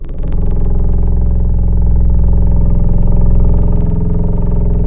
Cello Solo; Instruments; Synthetic's Serum Collection; Echo Sound Works Core Tables

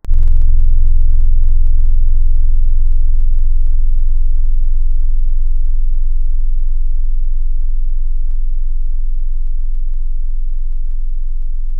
Keys Static; Synthetic's Serum Collection; ESW X Keys

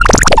Throaty, Serum Wavetables; Synthetic's Serum Collection; Cymatics - Nuclear for Xfer Serum Wavetables

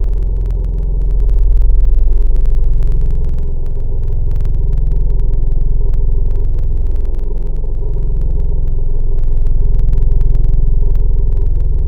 Vocal Digital Choir; Wavetables; Synthetic's Serum Collection; ESW Core Wavetables; Echo Sound Works Core Tables

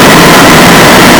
Fem Static; lolicore

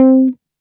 soft guitar C3; Aubit; Awake Vol. 1 Serum Noises

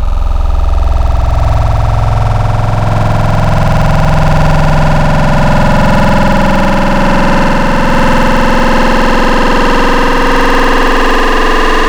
Spectral Tribal; ESW Spectral

